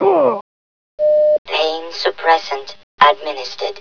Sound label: pain; sven; player; helmet